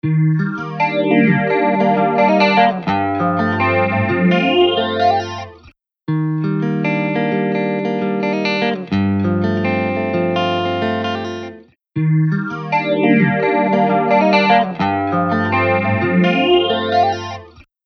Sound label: Clockworks